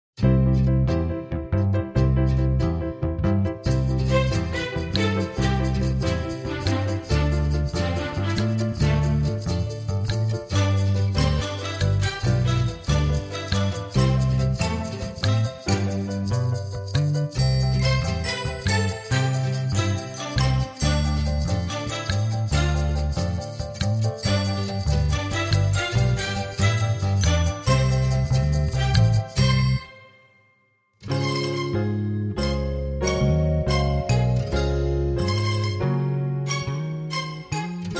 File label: music